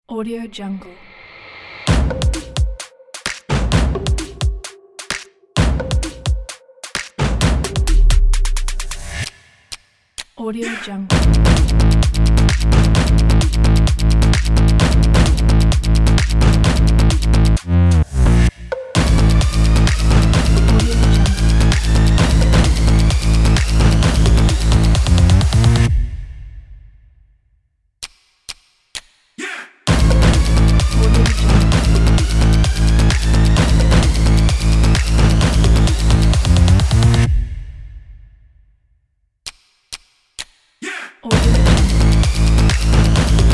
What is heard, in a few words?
drums trailer